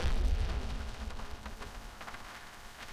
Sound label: air horn